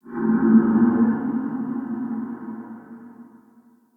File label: Tree Gnarled Oak Moan; Trees